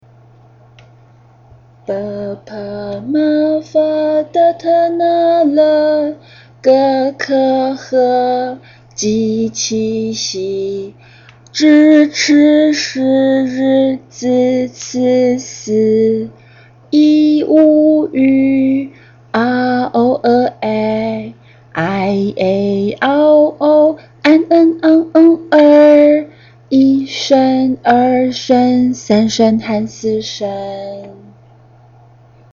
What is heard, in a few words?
bpm song little star